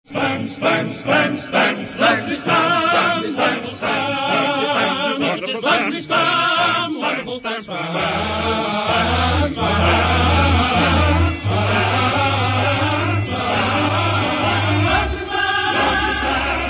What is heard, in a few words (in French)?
chanson